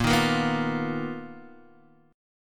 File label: B Major Sharp 11th Bb